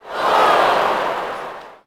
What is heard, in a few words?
Ooh, Crowd, reactions